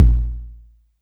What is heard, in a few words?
BD